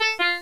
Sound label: Sax Clink-Clank; Bip Boing & autres